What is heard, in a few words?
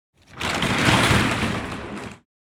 Truck rear door opening